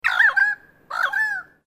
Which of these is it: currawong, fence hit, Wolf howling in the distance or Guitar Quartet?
currawong